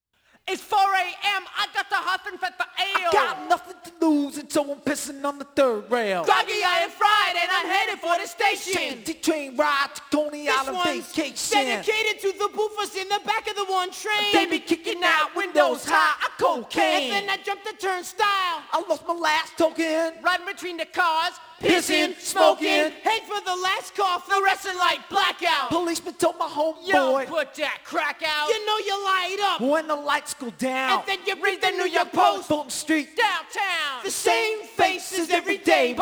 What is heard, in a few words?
acapella